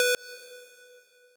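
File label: square lead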